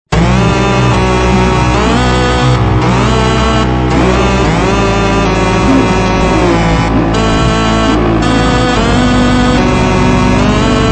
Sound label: melodica super slowed reverb